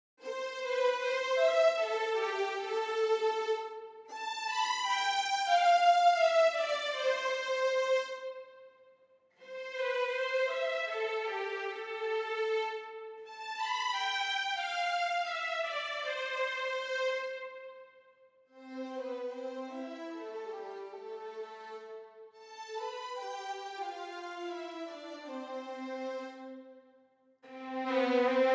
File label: String Legato